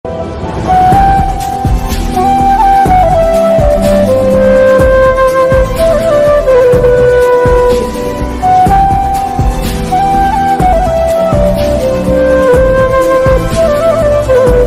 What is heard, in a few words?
flute ringtone